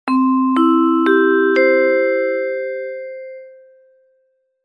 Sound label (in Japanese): お知らせベル